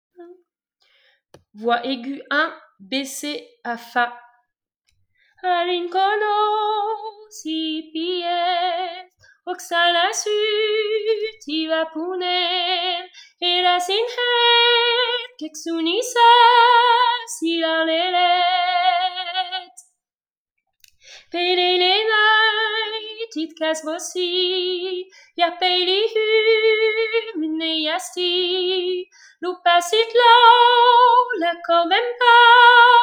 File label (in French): Voix aige